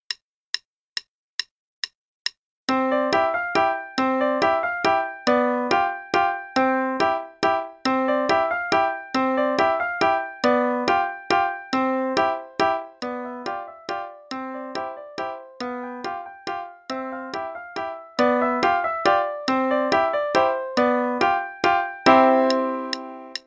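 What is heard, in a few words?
Ice Spinners Waltz (left hand waltz pattern, quarter note=140); Level 2B - 2nd Ed; Faber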